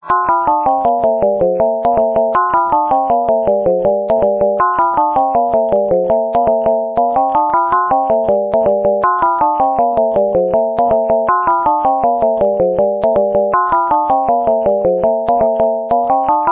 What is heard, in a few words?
doorbell; garryowen